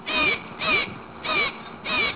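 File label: OTHER BIRD, ANIMALS; EFFECTS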